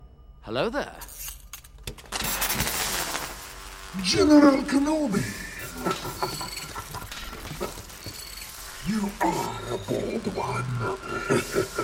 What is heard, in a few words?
Ringtone